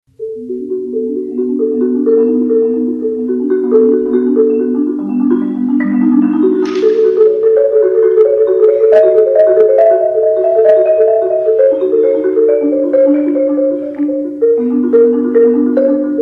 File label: diamond marimba